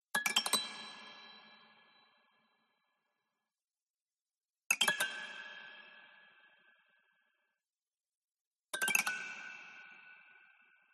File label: HORROR Haunted Strings Tinkle Sequence Fast Short; MUSICAL; smartsound